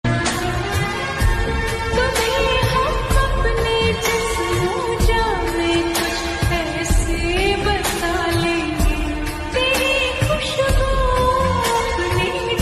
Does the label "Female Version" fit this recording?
Yes